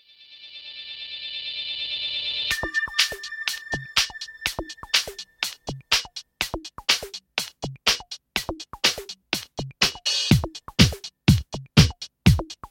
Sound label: Extended Disco Club Vocal